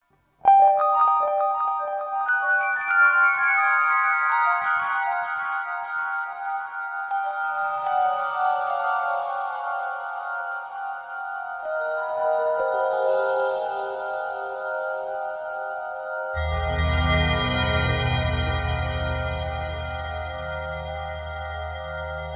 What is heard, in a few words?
Bell Threshold; StPauls